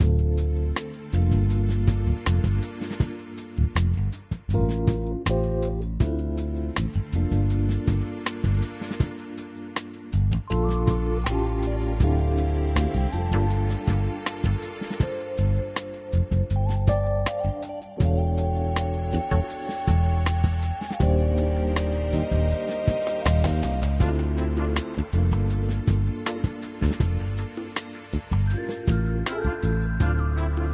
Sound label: background; European Roulette; Evoplay